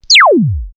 Zap, synthfx; X-Static Goldmine

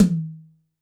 D3 - Room Tom, Yamaha PSR-E323 Room Kit

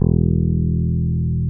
JAZZ FINGER E2, BASS; Greytsounds; JAZZ+P BASS

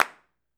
clap, Roland MV8800; REALCLAPS

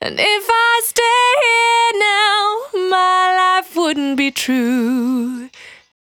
Cry 68bpm B, Vocal; Zero-G

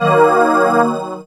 synth, d#, Techno Chords; Dance Synths

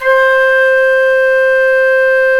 FLT FL AC, C Flutes

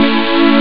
saw maj; jazz jackrabbit 2; M8

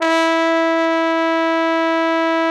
TROMBONE, SECTION LOOP; Best Service; Killer Horns

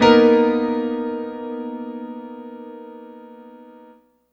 Piano stack (Bb) jazz; Sonic Foundry (Sony Creative Software); Ugly Remnants VOL-2

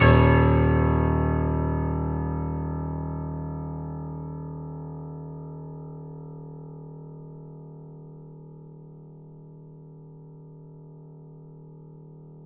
FF D#0, Grand Piano; AKAI S6000 CD-ROM - Volume 2; Grand Piano 256M

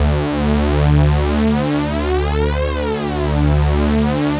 PHAT ACID D3; PHATYACID

